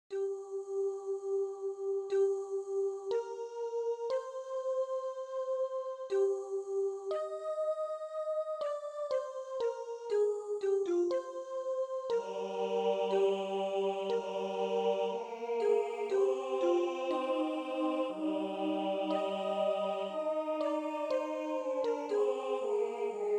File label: Sop Ten; O Vos Mantua